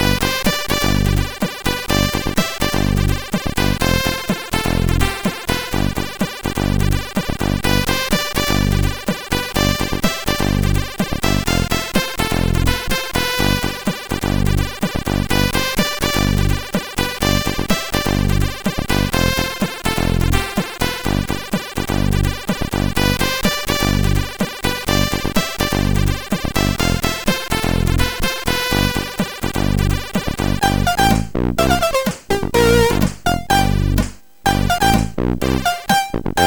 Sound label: Jazz, sid, MOS6581; Jazz Time